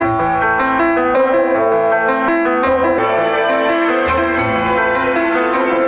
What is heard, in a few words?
piano and strings angst; Loops; Sad